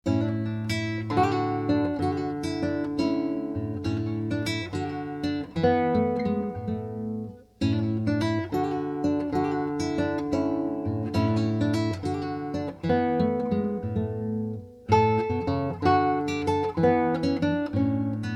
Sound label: Classical Guitar, Baroque Spanish Dance; Gaspar Sanz